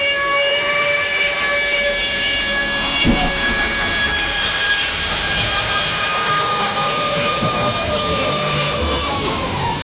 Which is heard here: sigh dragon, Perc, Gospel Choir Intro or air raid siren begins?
air raid siren begins